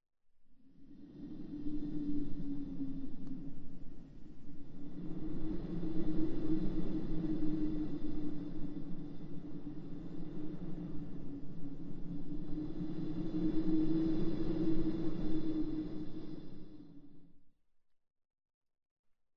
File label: wind